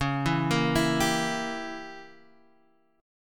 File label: C sharp Diminished 7th C sharp, Guitar Half Step Down